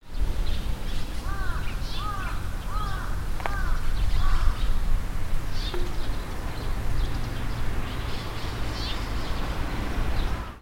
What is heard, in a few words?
mukudori, bird song; ムクドリ; 地鳴き①; ムクドリ科; 新潟県新潟市中央区 鳥屋野潟公園